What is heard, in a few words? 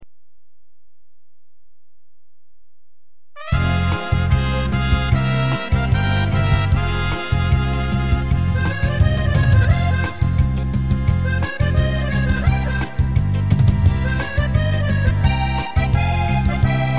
Carioca Rumba